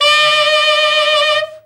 BRA TENOR OB, SAX; Best Service Gigapack 1; BR SAX 4

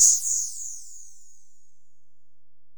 Ride, Alesis DM-PRO

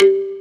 WOOD DRUM; Pro-Rec - Synthsonic

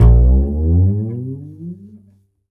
Upright Acoustic Bass; Larry Seyer; SWITCHABLE POSITIONS

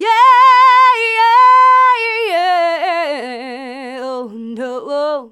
Female vocals and robo-vox

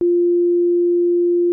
SINE LD; Kid Nepro; Elite

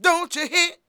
SING, Vocal Planet Blues Soul BLUESY

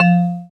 SPD-8, DIGITAL

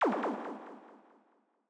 Ragga Blip, Grime, 138bpm; Zero-G Sound Sense Grime Scene; Construction Kits; Da Suspects

